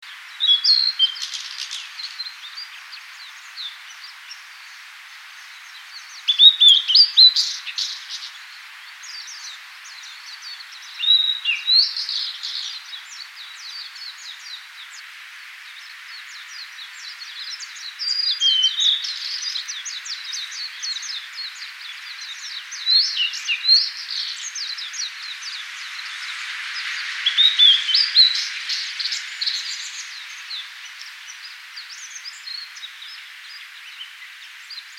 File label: Cyanoptila cyanomelana